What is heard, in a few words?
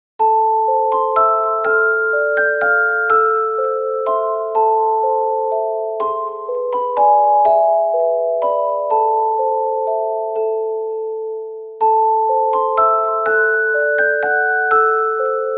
Waltz Celesta Solo Version; gekiban